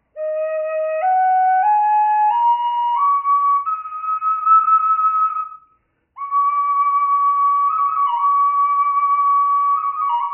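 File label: reverb, flute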